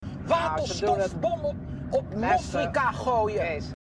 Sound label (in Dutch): waterstof bom